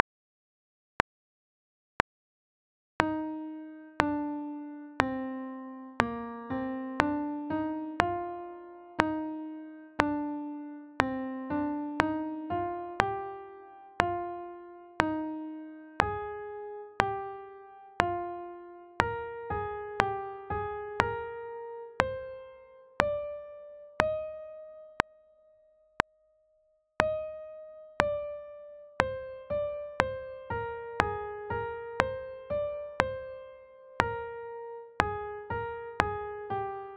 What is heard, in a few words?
e flat scale study; band resources